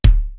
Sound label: FAT KICK; East West; Global Sounds